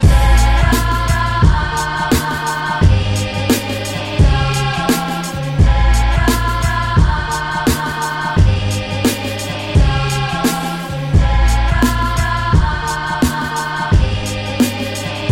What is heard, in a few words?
Children sing